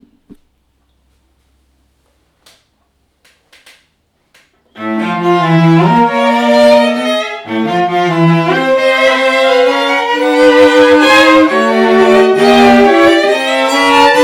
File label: Allegro agitato; Max Reger